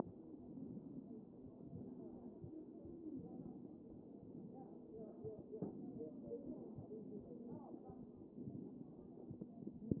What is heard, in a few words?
CHEST pos1 D MODE